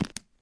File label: Visor down end; In the Line of Duty Firefighter